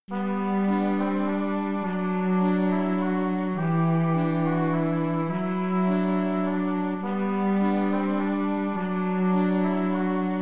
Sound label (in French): Noel catalan